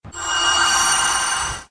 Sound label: chime; raising heart; nanoha